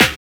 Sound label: THUMPY SNR; Drums In Your FACE!